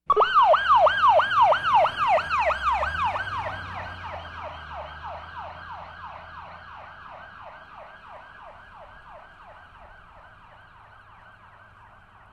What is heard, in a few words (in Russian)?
policejskaya mashina uezzhaet s sirenoj